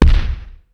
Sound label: KICK, Hip Hop